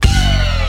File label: Bonus Wheel Symbol; JackpotDarts; ClubFusion